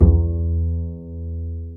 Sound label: Bass, Roland